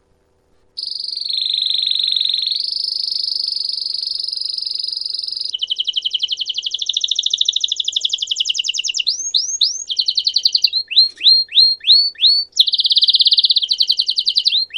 domashnjaja kanarejka

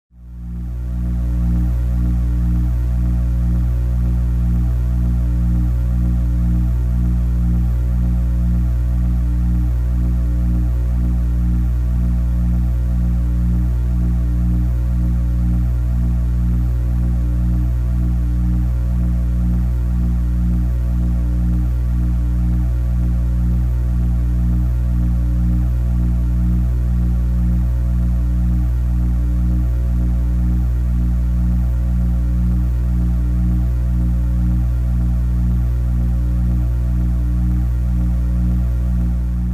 nizkochastotnyj elektricheskij shum

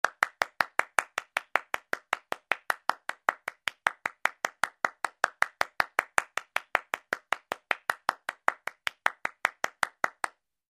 zvuk hlopkov v ladoshi

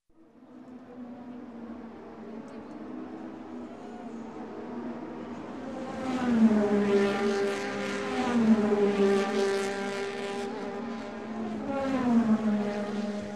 proezd mashin formula 1 i shum tolpy

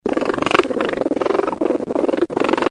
detenysh enota

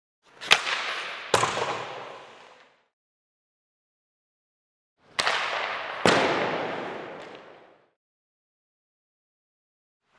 schelchok kljushkoj po shajbe

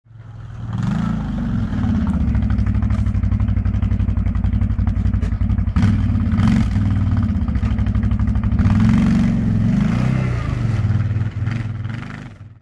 dvigatel kvadrocikla

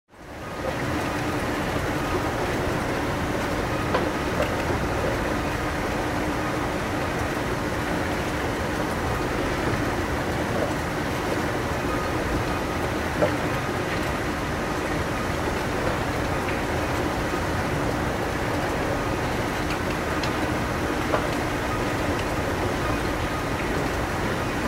zvuk eskalatora

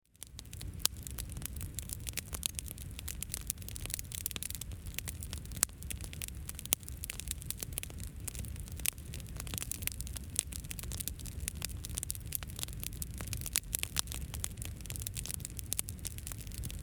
nochnoj koster

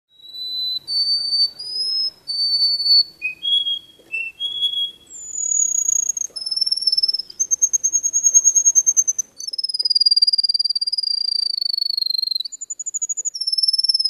zvuki kanarejki

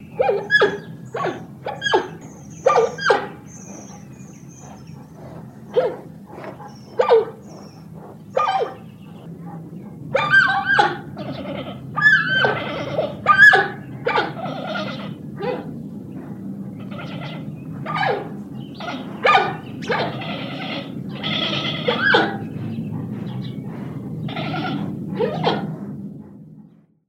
zvuk maloj pandy

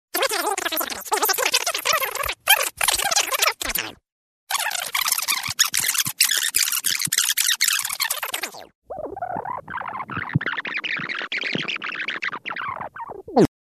zvuk proigryvanija na skorosti peremotki

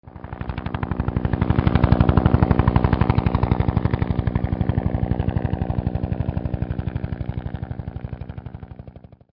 Приближающийся вертолет

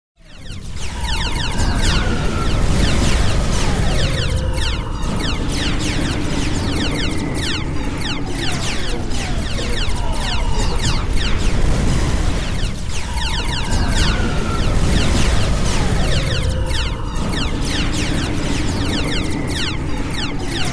kosmicheskoe srazhenie

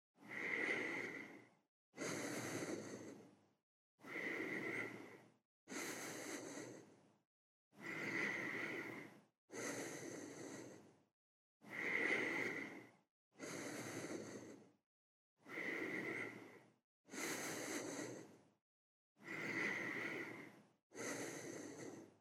spokojnoe dyhanie

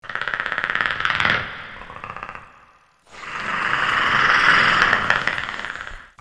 zvuk strekotanija hischnika

